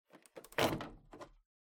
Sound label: Air Purifier Panel Open